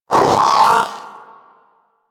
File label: Vocal Alert, Pets; Warframe; Zanuka; Zanuka Companion A